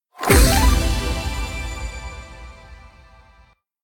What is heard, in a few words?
level up